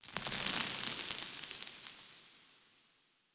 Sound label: environment rock debris